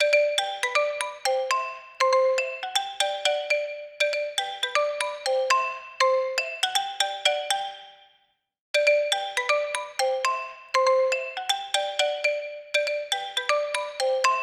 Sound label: Xylophone, ringtones; LG V60 ThinQ